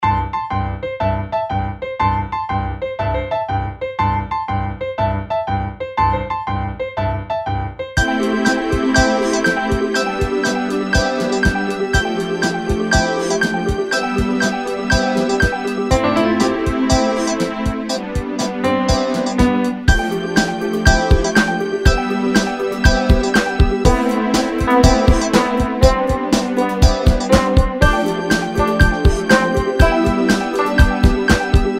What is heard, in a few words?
Toy Keys